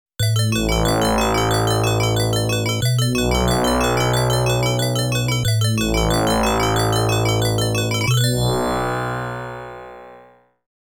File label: ROUND 12 CLEAR . ENDING; Taito Digital Sound Archives Arcade Vol.1